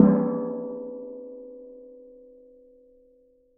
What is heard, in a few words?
Strike, Washing Machine Drum Outside